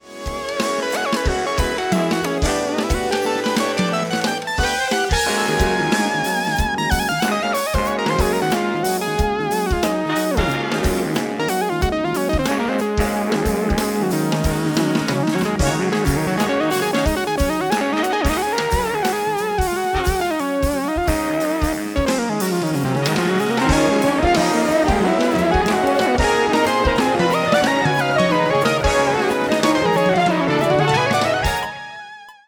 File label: Cocotte Bass